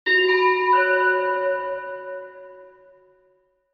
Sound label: Clear Jingle; bayonetta origins cereza and the lost demon; Aces!; Tír na nÓg